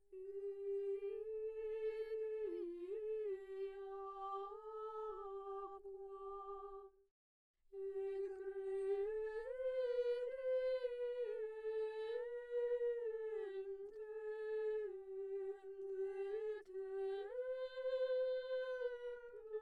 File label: cardioid